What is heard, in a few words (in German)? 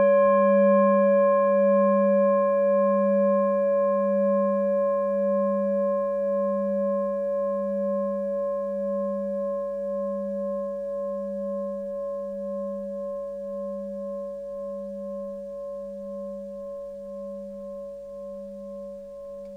klangschale orissa; Die Klangschale kommt aus einer Schmiede